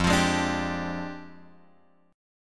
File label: F Minor 6th Add 9th F; Fm6add9 Chord {1 X 3 1 3 3} strummed